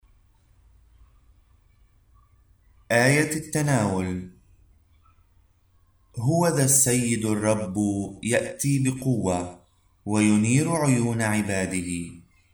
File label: Communion Antiphon; Wednesday of Advent